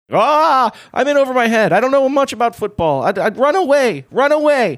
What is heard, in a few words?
aaaahhhhh; im in over my head